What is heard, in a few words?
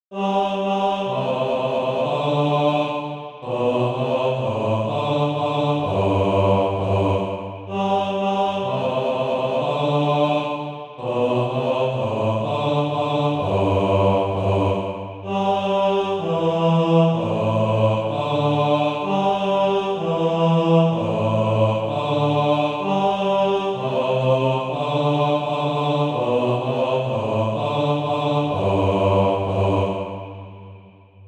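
bass, SATB Practise Music; Ding Dong Merrily on High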